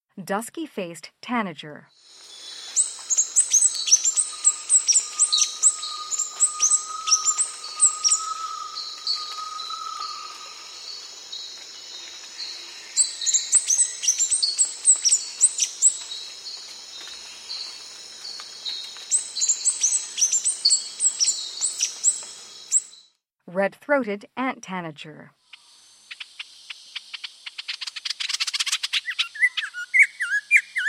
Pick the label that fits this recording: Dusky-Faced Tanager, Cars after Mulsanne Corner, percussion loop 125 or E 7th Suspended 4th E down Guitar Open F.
Dusky-Faced Tanager